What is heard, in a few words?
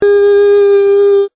eee, Voice ooh ahh